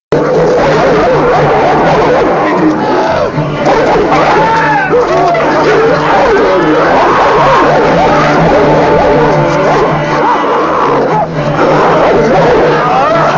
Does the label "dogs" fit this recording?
Yes